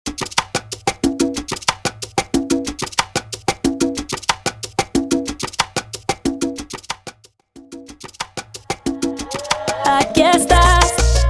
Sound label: Transition Salsa To Reggaeton 92BPM